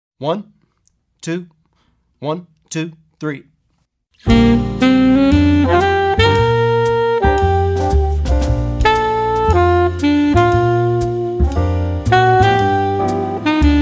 Jazz Alto Sax with alto sax soloist; Effective Etudes For Jazz Alto Sax

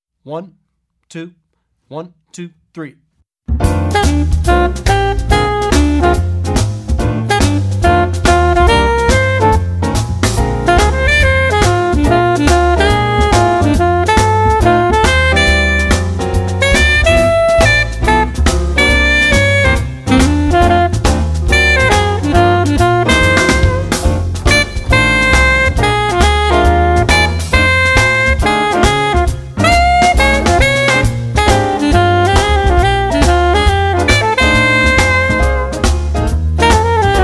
Jazz Alto Sax Blues, with alto sax soloist; Effective Etudes For Jazz Alto Sax

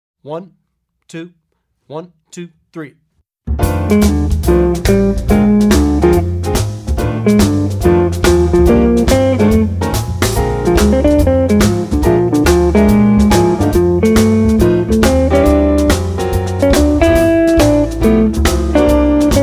Jazz Guitar Blue Note Blues with guitar soloist; Effective Etudes For Jazz Guitar

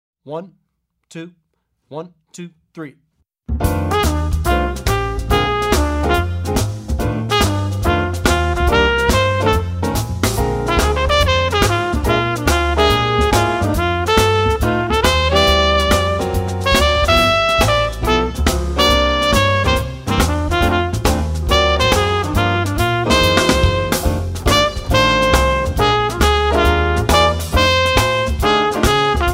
Jazz Trumpet Blue Note Blues with trumpet soloist; Effective Etudes For Jazz Trumpet

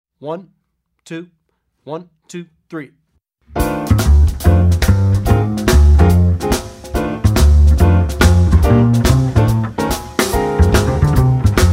Jazz Bass Blues, with bass soloist; Effective Etudes For Jazz Bass

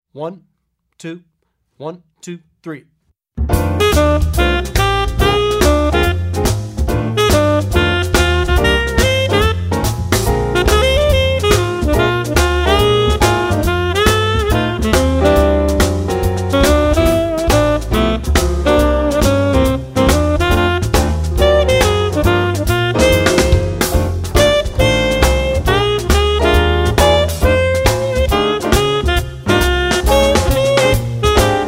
Jazz Tenor Sax Blues, with tenor sax soloist; Effective Etudes For Jazz Tenor Sax

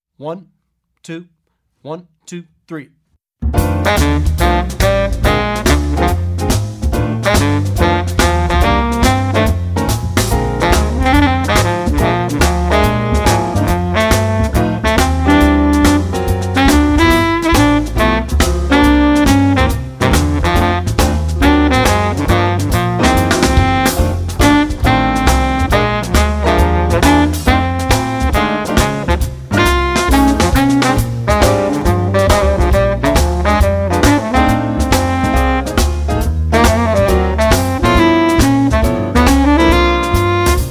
Jazz Bari Sax Blues, with bari sax soloist; Effective Etudes For Jazz Bari Sax

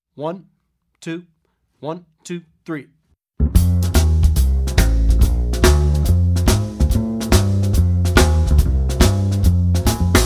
Jazz Blues, without piano soloist; Effective Etudes For Jazz Piano

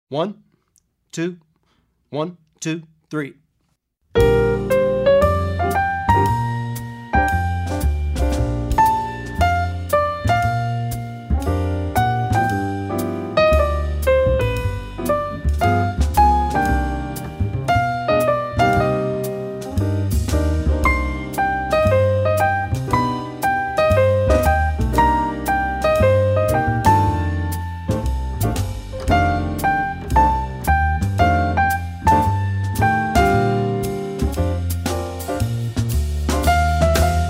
Jazz Piano, with piano soloist; Effective Etudes For Jazz Piano

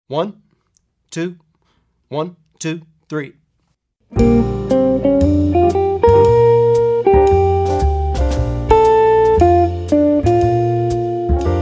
Jazz Guitar with guitar soloist; Effective Etudes For Jazz Guitar

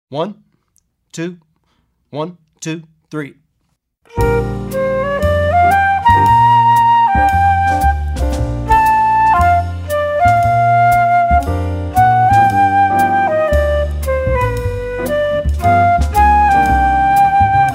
Jazz Flute with soloist; Effective Etudes For Jazz Flute

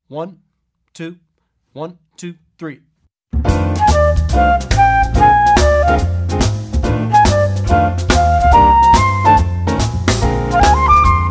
Jazz Flute Blues, with soloist; Effective Etudes For Jazz Flute

